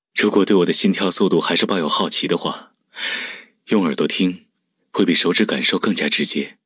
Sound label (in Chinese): 心跳